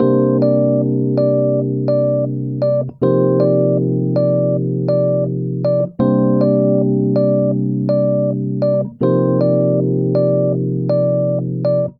Progression Soulful Hip Hop i - iv C Minor 80 bpm, Electric Keyboards; Ableton; Factory Packs